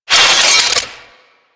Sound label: Drone Blade Jump; Weapons